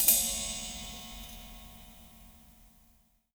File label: jump